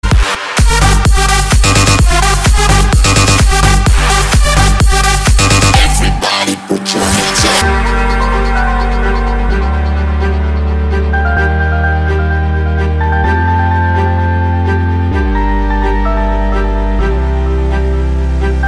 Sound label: aliens electro, ringtone